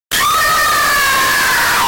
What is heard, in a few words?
scream fast static; luigi